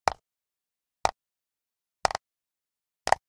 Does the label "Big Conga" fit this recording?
No